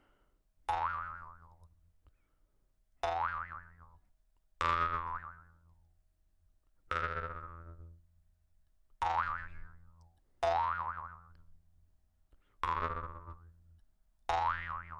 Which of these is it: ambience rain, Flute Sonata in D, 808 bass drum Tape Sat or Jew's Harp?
Jew's Harp